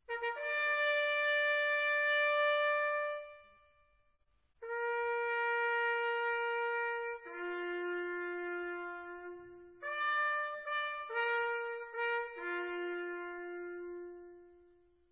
reconocimiento, corneta

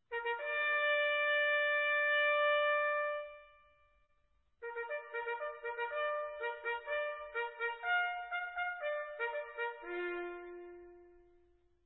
orden, corneta

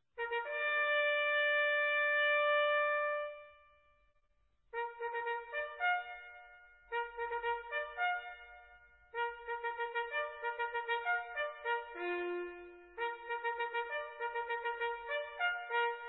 batallon y llamada, corneta

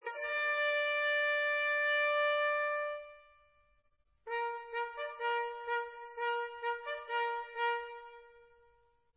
compania, corneta

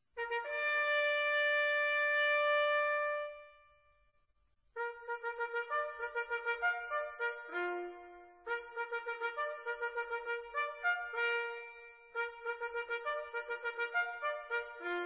llamada, corneta

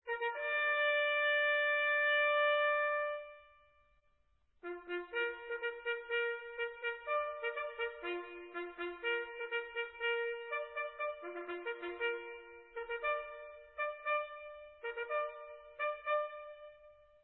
fagina y parte, corneta

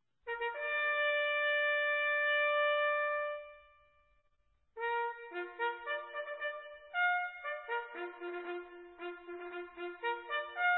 bando, corneta

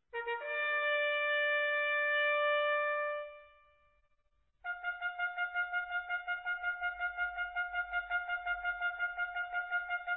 alarma aviacion, corneta

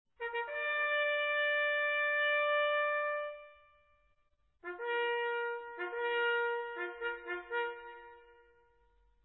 llamada banda, corneta

